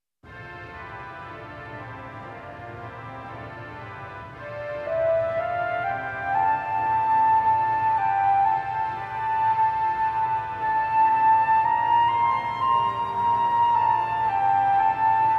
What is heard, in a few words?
flute and organ; Picardy; MassOfThanksgiving